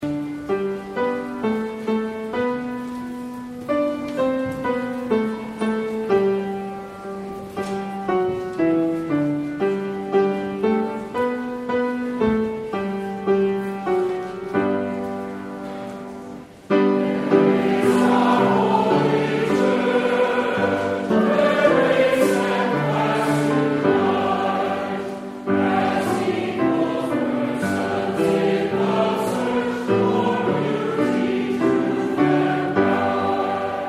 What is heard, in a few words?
Hymn